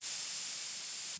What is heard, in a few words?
Compressed Air Long